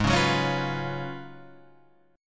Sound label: F sharp Major 7th Suspended 2nd Suspended 4th, G sharp